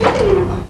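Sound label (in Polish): pstryk, click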